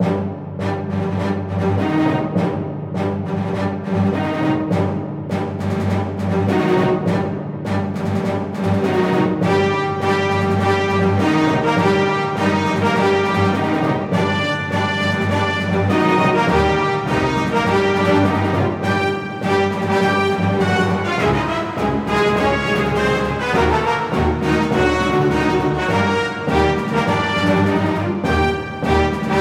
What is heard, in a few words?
instrumental; darth vader